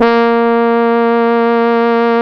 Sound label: A#, Synclav FM, BRASS; Big Fish Audio